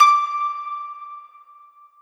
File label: harp